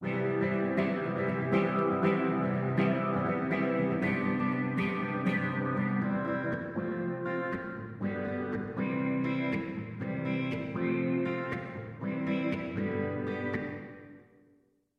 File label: Auto-wah + reverb